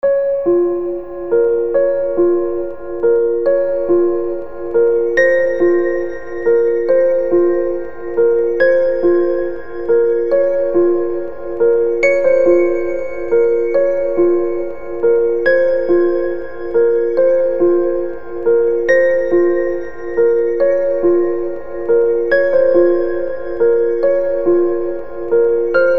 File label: piano